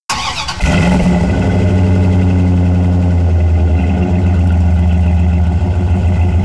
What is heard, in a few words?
Dodge Ram Start; Vehicles